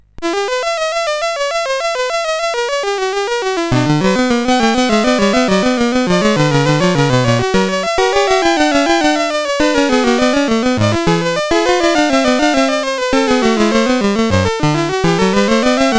Fugue No 1, CSG8580R5

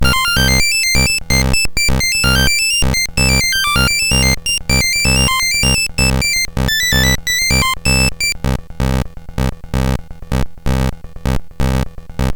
Calypso, CSG8580R5

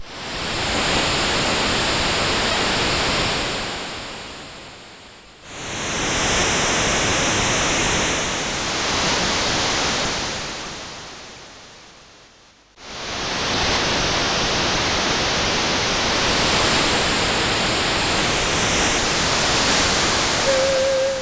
sid, CSG8580R5; Sundrench Tidal Extasy

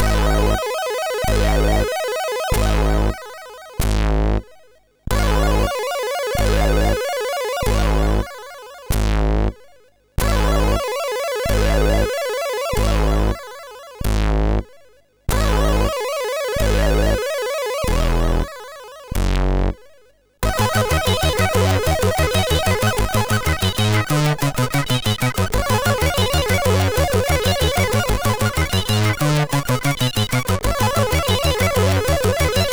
Small Intro Tune sid MOS6581R3

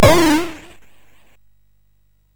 SFX, MOS6581; Narco Police

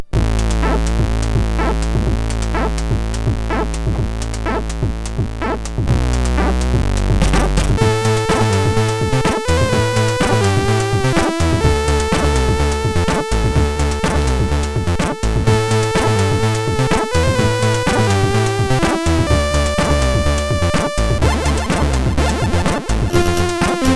sid, MOS6581R4

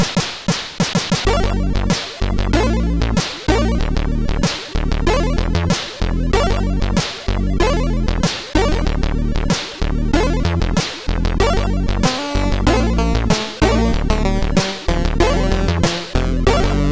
Relaxation, 8580; V3 NTSC

